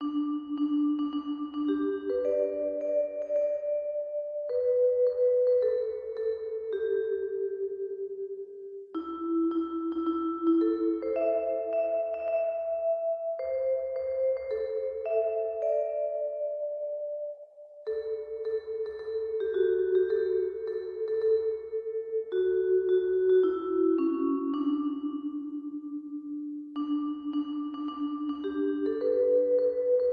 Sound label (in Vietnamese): nhac Hanh khuc midi